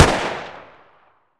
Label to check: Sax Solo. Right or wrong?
Wrong